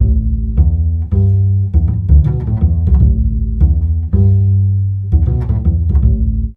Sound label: JAZZ